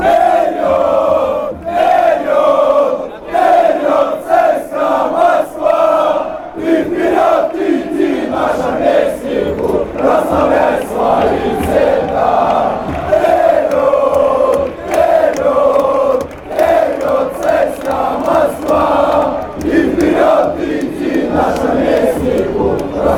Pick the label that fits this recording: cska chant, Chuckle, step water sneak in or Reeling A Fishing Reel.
cska chant